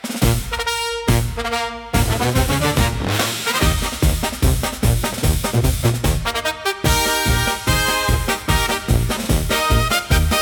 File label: Parade of Tin Drums, upbeat carnival for festivals and parades